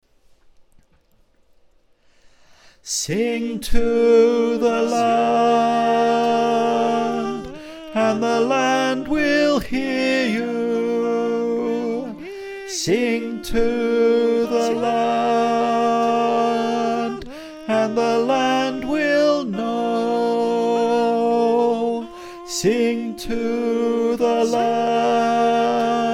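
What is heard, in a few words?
tenor, choir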